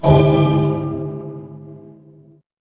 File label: instrument bell